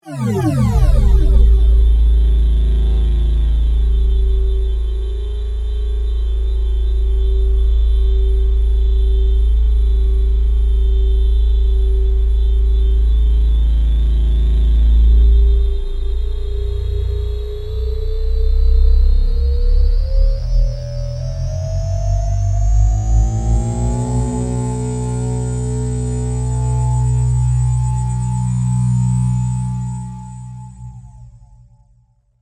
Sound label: Engine Start, Science Fiction Engine Start Accelerate; Tech; BlastWave